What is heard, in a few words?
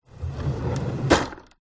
open drawer; fox audio